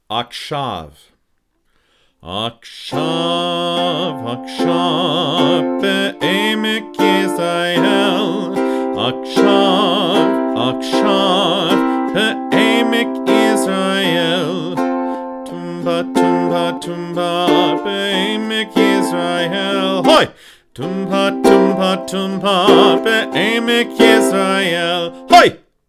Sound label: Achshav, ukulele